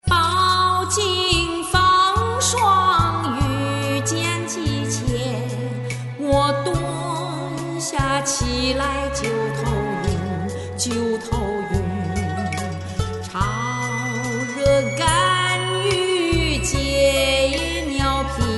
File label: ringtone